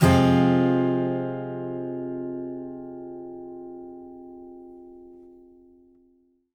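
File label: Acoustic guitar F minor down, Country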